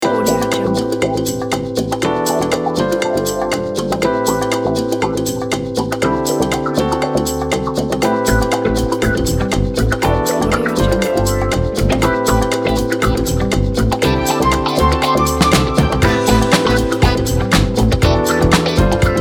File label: fashion modern funk soul background; dailywaves